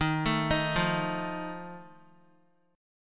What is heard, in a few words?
Eb Suspended 2nd Flat 5th Eb Guitar 4E 4A 5D 5G 5C 6F; Ebsus2b5 arpeggiated; JGuitar